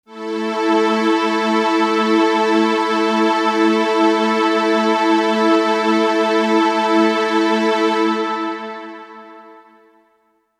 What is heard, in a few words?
Synth Pad A; Sonic Foundry (Sony Creative Software); Techno Synth Loops 1 Signals I